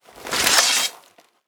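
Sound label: blade one hand draw, equip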